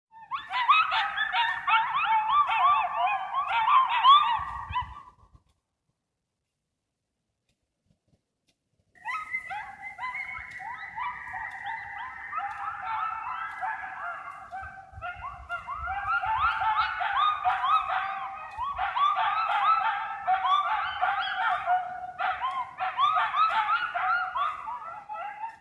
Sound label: Coyotes Long Hill Road; Seasonal Sounds